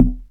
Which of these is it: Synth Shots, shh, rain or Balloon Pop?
Synth Shots